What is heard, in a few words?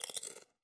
bite hard; angband